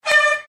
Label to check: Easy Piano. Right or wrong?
Wrong